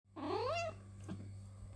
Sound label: Cats urr